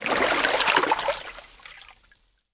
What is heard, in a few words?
Water Splash